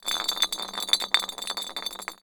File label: Household Glass Spin Beer Bottle